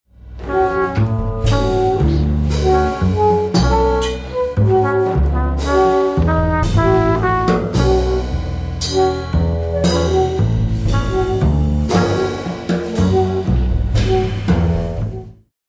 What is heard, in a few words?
Quartet; Hollis Heaven